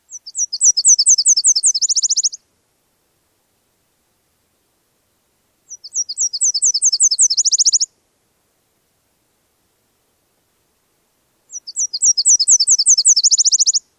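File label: Nashville Warbler, songs number 2; NY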